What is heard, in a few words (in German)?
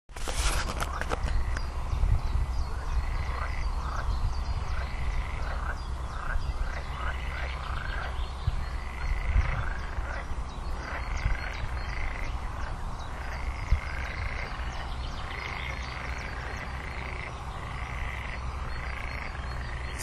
Kleiner Wasserfrosch, Rufe